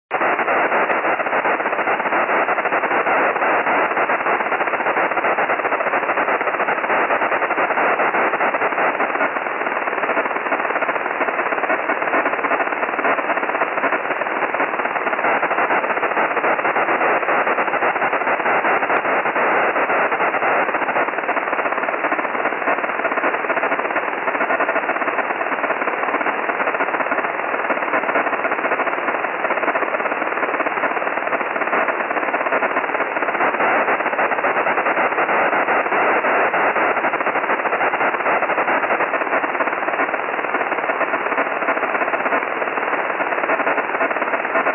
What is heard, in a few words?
PLC 28500 SSB USB MODE BW 2.7 KHZ